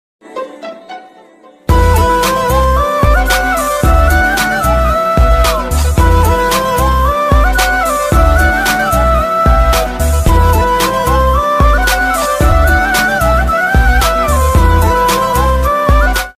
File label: love bgm ringtone punjabi